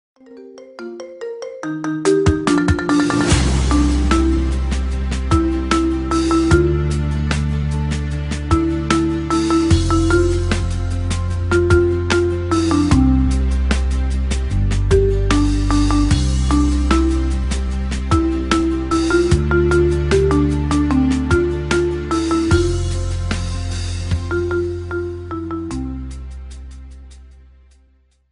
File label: marimba remix ringtone